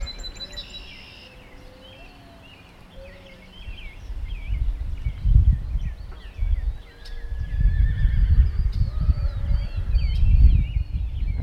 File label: horse